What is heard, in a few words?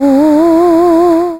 deer